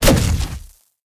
kappa death disappear; smite; kuzenbo

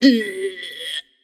nv tongue; smite; voicepack; morgan le fay

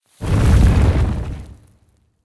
dirt rumble; smite; khepri

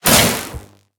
land; smite; raijin

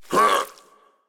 hitreact small; minion s8; smite; npc; roman archer

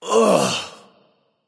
"ugh"; smite; merlin

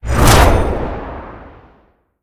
lift; smite; tiamat

